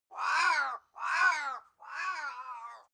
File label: crow single abstract fly by; natur tiere; voegel